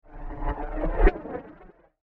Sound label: Alien Jet Machine; Alien Sound Effects; science fiction sound effect